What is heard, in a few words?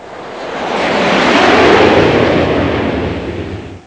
jet flyby